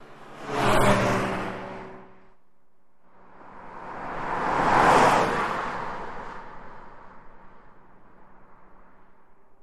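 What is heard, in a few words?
Car Fast By X2 Short Medium; bys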